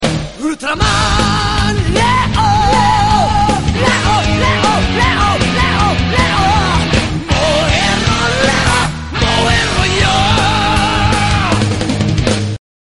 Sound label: Metal